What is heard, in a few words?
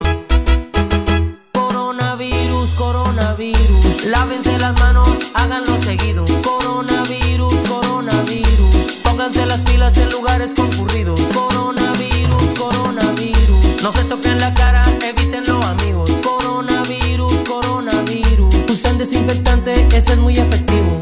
cumbia coronavirus